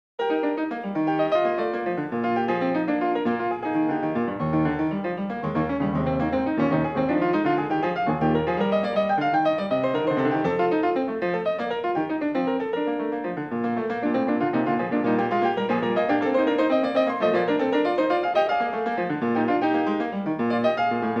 Prelude No. 7 in E-flat major, Bach, Well-Tempered Clavier; BWV876